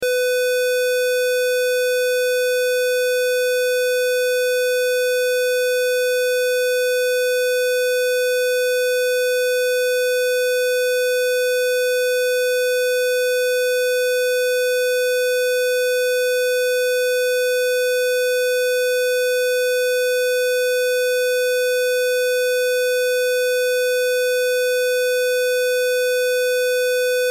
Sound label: Test Tone Square; Wave 500Hz 60 Secs -18Db Loop